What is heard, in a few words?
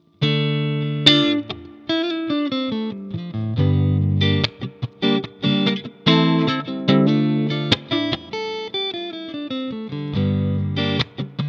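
clean Neck SC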